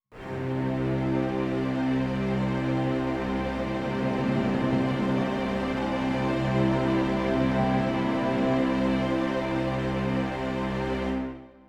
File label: Duel - Combo Win Pad